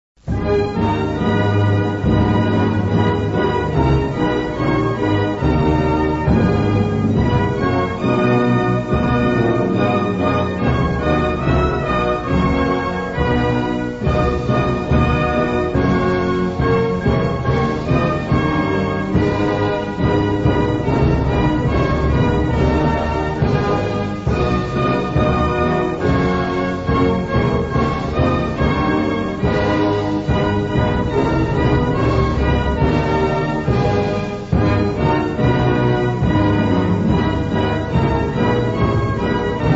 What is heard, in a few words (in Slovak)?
Hymna SR hudba